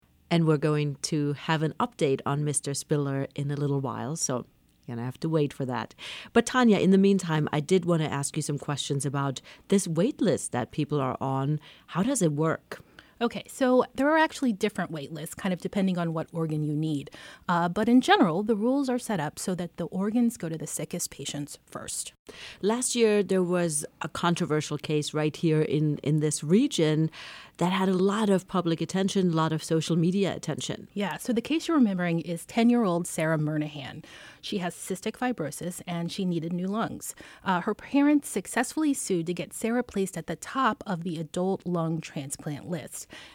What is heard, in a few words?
organ; organ two way edited